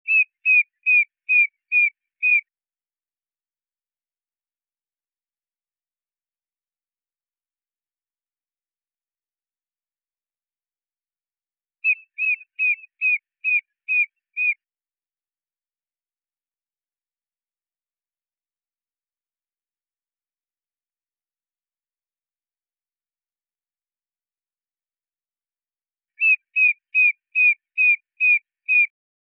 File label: rhinoderma darwinii